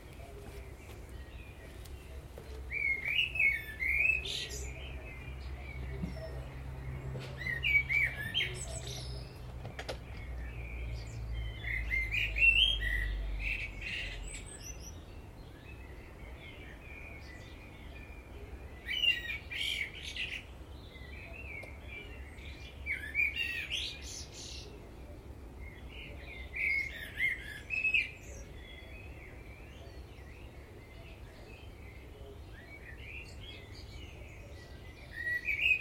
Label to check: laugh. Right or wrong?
Wrong